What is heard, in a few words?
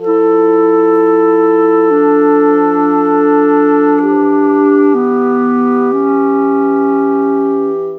Clarinet, Cinematic; Sonic Foundry (Sony Creative Software); Orchestral Series VOL-3; Cinematic 27